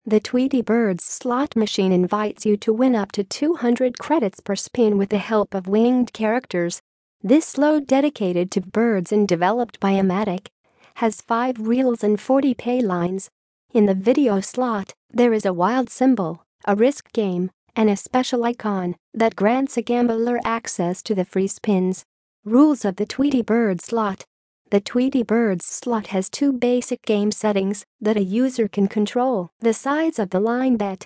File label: Tweety Birds